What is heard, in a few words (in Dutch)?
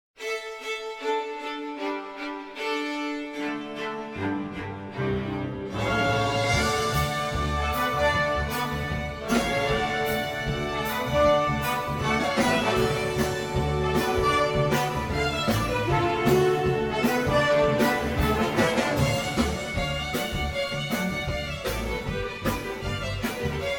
hoedown rustig